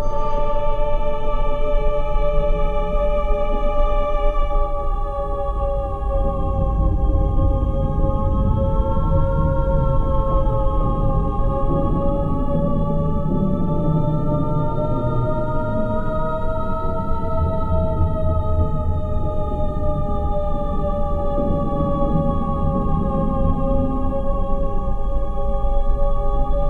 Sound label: Music Loop; Infinite Hole; Music Loop 15